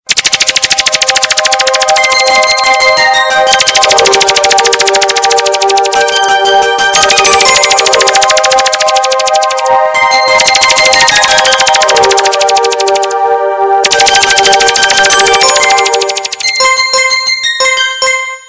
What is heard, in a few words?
turkish